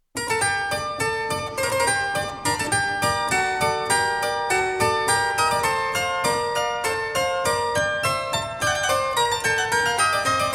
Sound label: Air for harpsichord in G major; Henry Purcell